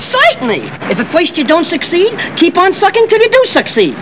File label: succeed